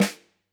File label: Snr, drum